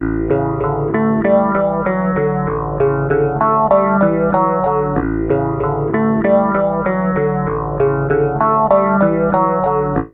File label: Bass Guitar Loops